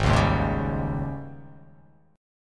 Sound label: Ab Major 7th Suspended 4th Ab down; strummed; JGuitar